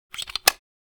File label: Cassette case being opened